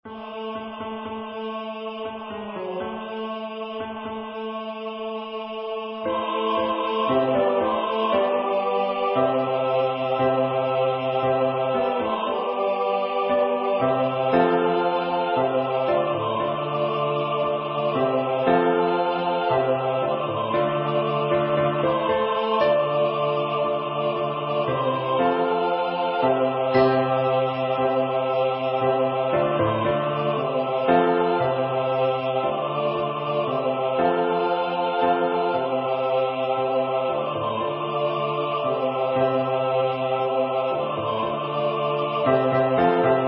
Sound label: Gloria SATB